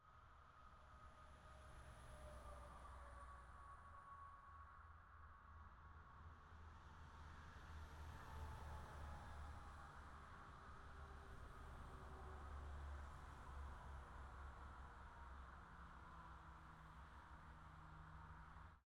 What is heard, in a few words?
noise 120db